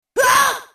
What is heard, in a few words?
hurt; snes; smas; uranus